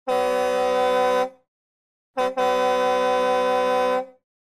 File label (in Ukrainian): Signal klakson fury gruzovika